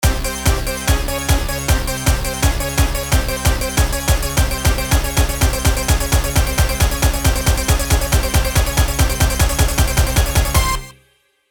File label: Drowning; Sonic the Hedgehog 4